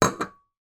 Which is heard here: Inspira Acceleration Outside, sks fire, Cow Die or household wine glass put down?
household wine glass put down